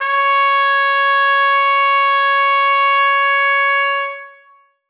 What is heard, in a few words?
trumpet c#5; Sonatina Symphonic Orchestra